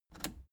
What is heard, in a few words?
plastic button scratch smooth push enable